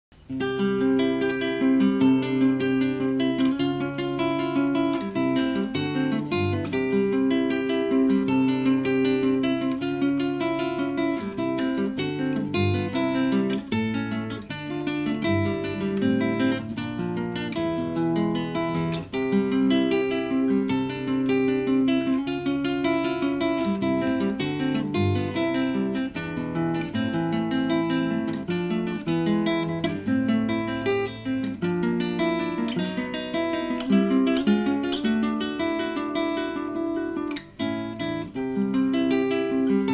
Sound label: guitar; plappermaulchen